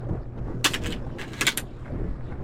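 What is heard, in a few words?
Desert Eagle Reload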